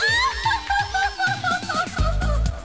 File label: laugh; aeka